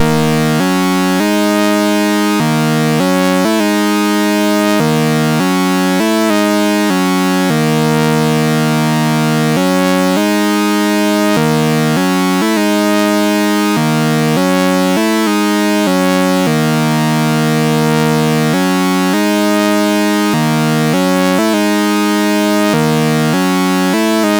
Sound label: sid, MOS6581R4; Escape from New York